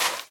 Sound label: sand step; Golden Crayon Sounds